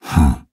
Nonverbal Judge; Ornn

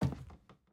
Footstep Lab

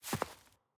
Footstep Grass

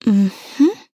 Nonverbal Question; Aurora

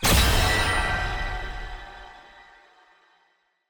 UI Event Style Rank S; bloom minigame

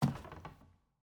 Footstep Wood Stairs

